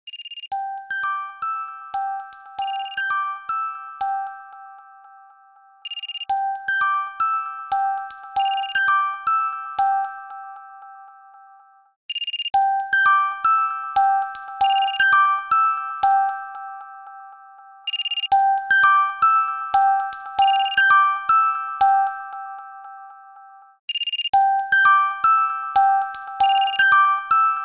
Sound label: Crisp Ring, alarms; Vivo; X27 Pro